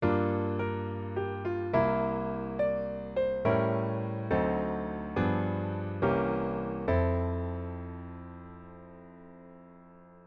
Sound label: dim7 original bass